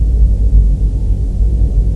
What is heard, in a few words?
shoop